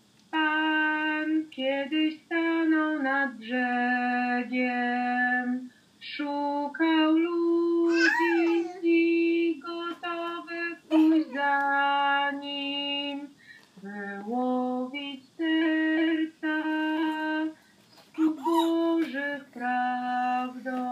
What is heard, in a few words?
polish verse